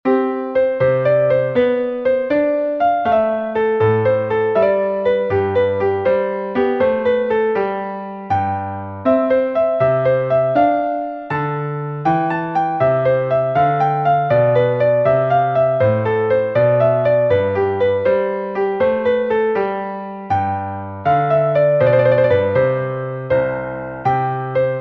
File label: harpsichord; John Carolan